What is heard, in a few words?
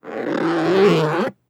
Zips Plastic Zips Slow, Household